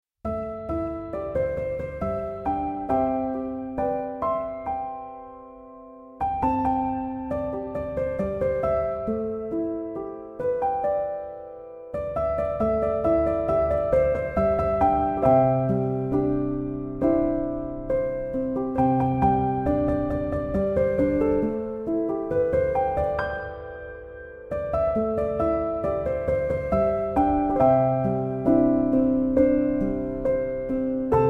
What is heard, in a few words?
piano instrumental